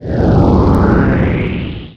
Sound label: spaceship engine short; SuperTuxKart; introcutscene